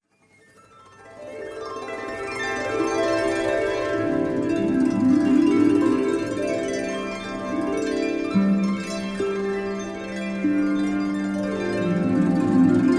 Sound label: harp